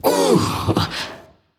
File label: notice; smite; voicepack; medusa